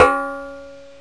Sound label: Clink Klank